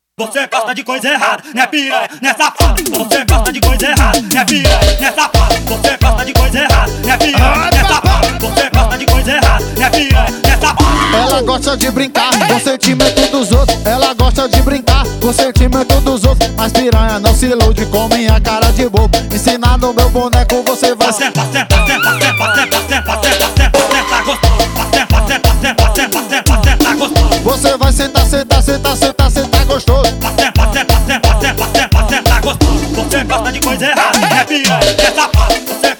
piseiro

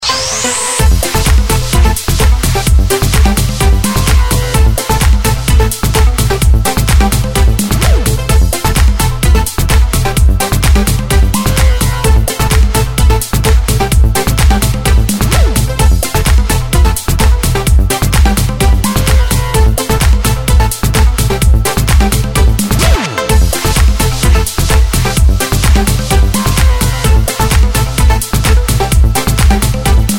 trilhas dance

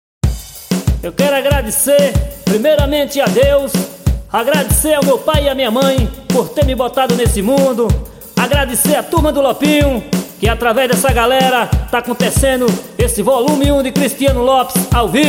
trilha

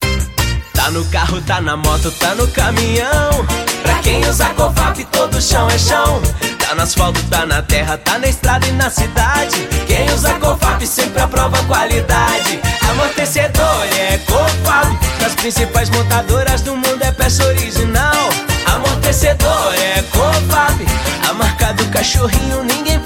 Jingles; cofap

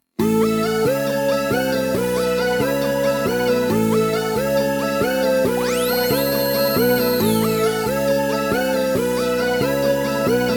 Instrumental Pagodão Beat

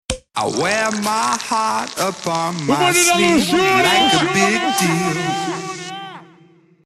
vinheta

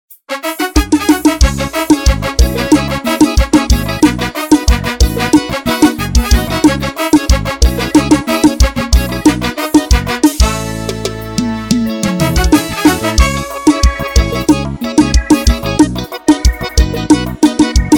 berimbal metalizado, forro

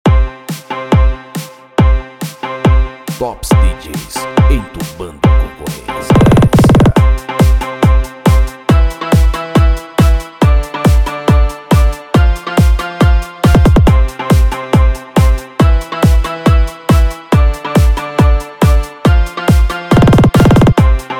batida extra pancadao automotivo

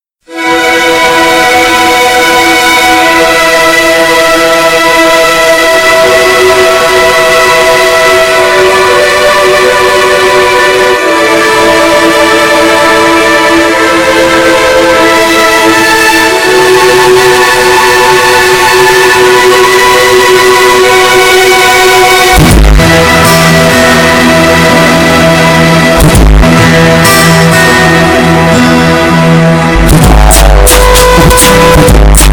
funk triste graves; naruto

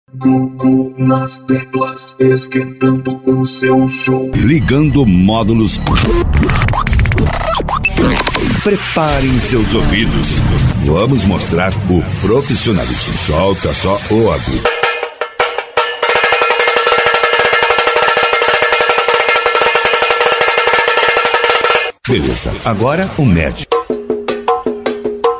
pasagem de som P.A teclas, Instrumental

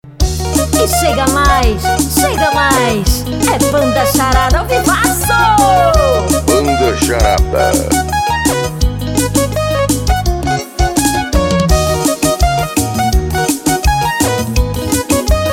brega; brega do pop; Belém

